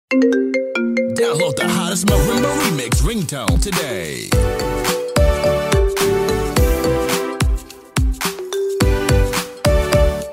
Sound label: ringtone marimba remix